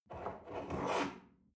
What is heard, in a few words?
espresso grab from table slide soft, restaurant cafe